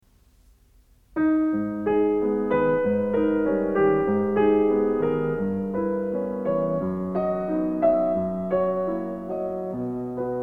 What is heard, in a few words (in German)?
Kinderszenen für Klavier; Wilhelm Kempff; Op 15